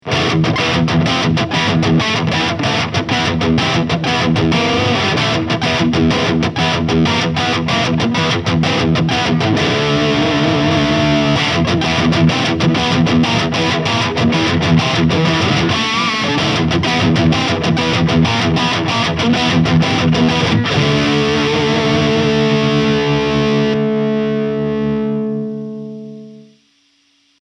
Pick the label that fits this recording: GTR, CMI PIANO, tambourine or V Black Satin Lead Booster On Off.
V Black Satin Lead Booster On Off